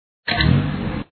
sfx button; super racer

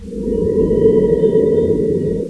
wind low; Notrium

